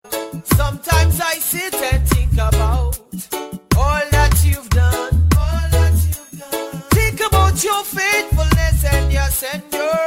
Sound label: worship reggae ringtone